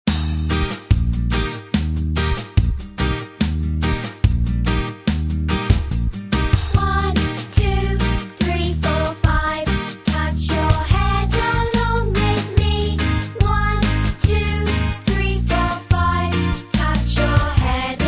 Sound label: Sing and do Welcome Kids